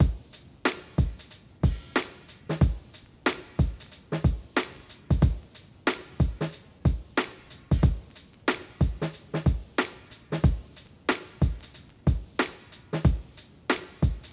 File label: drum loop